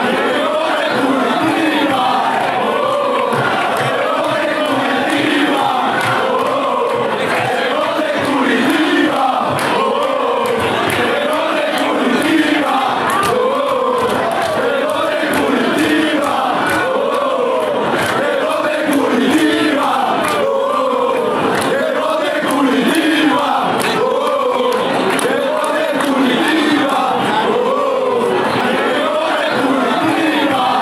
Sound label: que bote curitiba fanchants